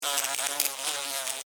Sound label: Fly Attack Buzz, Animal, Fly, Attack, Buzz, Wings, Insect, Bug